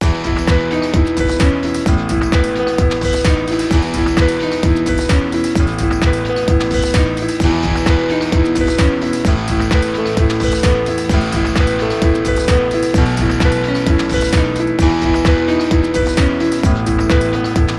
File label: Piano; think positive